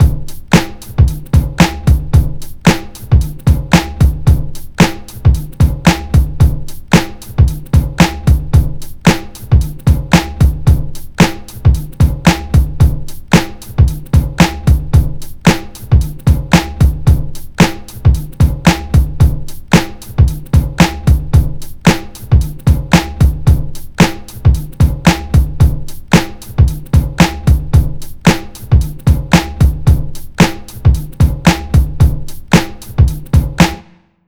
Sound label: 112.5 Ultrawood Bouncer, Breaks; Lockdown Breaks